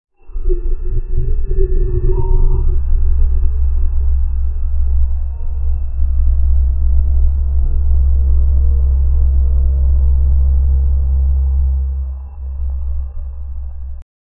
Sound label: the low rumbling of a, alien language